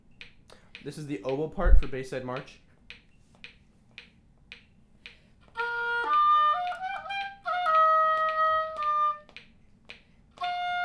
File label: Bayside Oboe